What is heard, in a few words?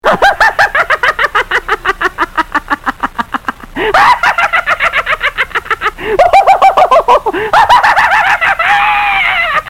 hahaha laugh ringtone